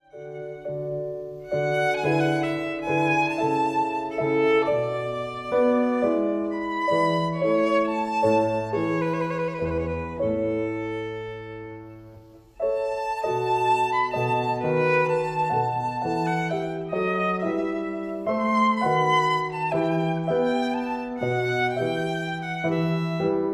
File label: VIOLIN; Trinity College London violin exams for 2016-2019; De Fesch: Ceciliana and Vivace from Sonata no. 1